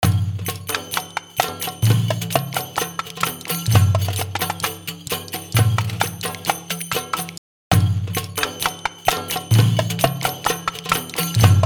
Perc, Mullard Short Plate

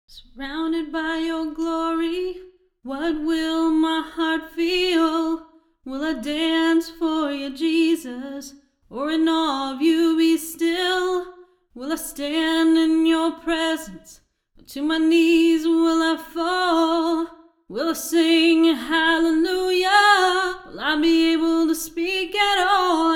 Vocal Small Room

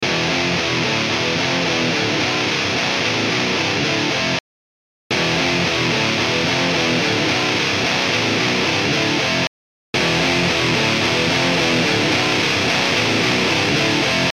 Guitar dry sat

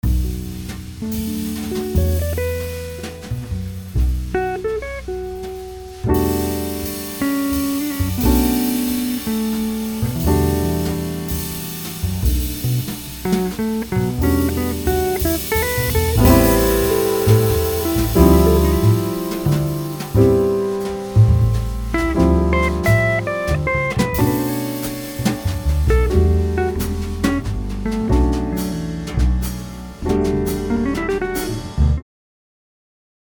Jazz; RadioTechnique58s

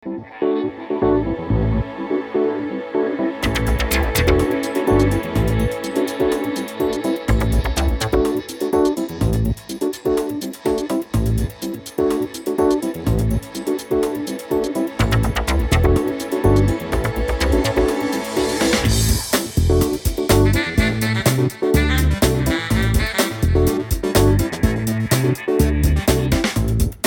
Electronic Retro Studio Mid 1kHz

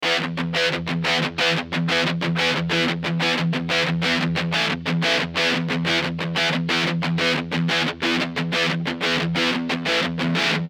Stereo El Guitar Before

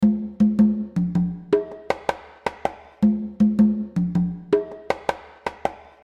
Perc Drum Chamber

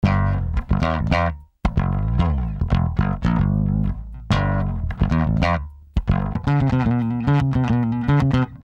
Bass After A Little Growly

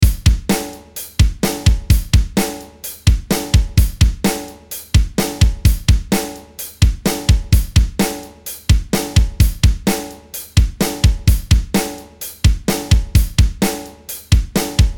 Drum mix sat

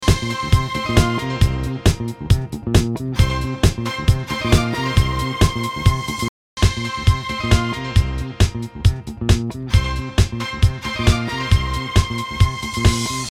House Loop

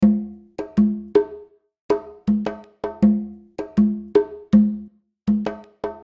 Perc Wood Room

